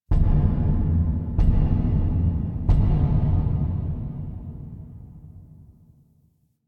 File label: Misc Knocks Large; Warframe; Ambience; Entrati